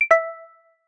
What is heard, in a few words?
notifications